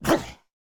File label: Boxer Death Vocals